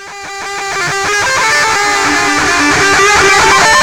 FX guitar; Big Beat Samples & More; AKAI

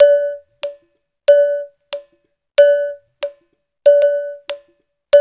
Udu; Spectrasonics; Supreme Beats; African Contemporary; Udu Bata

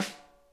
snare; Sonic Reality; Interactive Drum Kits

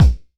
Hammer Aggressive, Kicks; Airwindows

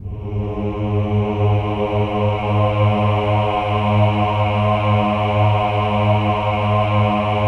OH-AH G#2, choir; Spectrasonics; Symphony of Voices

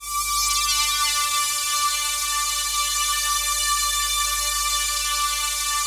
PAD, Dance Mega Synth

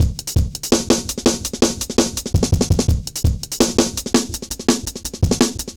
Jazz Shuffle, Drum Loops; Zero-G Jungle Warfare 1, 2 & 3; 90 s Sample CDs

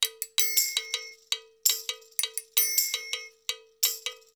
110 MIX, Percussion Loops

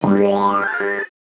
VocoBit Noise, 8-bit; musicradar; 8-bit bonanza

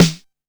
Snare, TR626

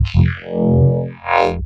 Reese Bass F; Antidote Advent

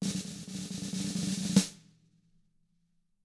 ROLL, SNARE ROLLS; ILIO; Double Platinum Drums 2

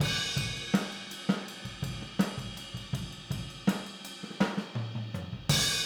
Boogaloo Fill Overhead ST, 82 BPM, Drums; Sonic Foundry (Sony Creative Software) - Drums from the Big Room The Mixes

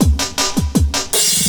LOOP, 160 BPM, Drum & Bass

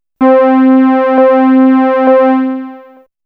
M Saw Toof C4, Fairlight CMI IIe Analog

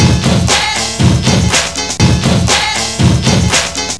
Clap Break, breakcore; Breaks

